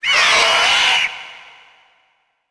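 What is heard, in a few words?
eagle voice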